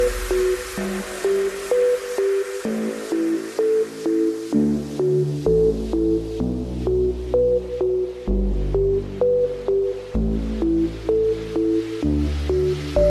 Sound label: shadow ring new mix ringtone; iphone se